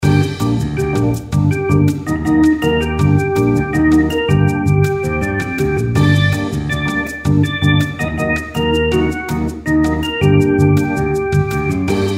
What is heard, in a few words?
Imperial Lift Music